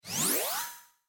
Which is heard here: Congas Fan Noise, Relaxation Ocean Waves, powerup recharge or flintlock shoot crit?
powerup recharge